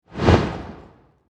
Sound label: Horror Magic Wand Blast Shot; SFX Bible